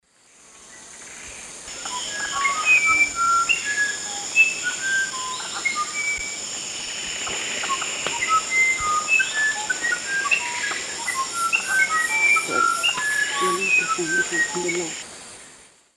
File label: Cyphorhinus arada, song; S. America; Eastern Lowlands